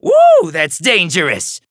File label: Taking Damage; Demon; paladins